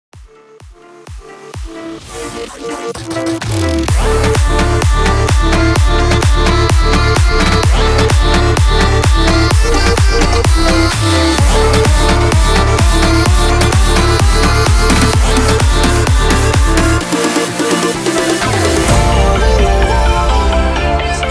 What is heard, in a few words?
128 BPM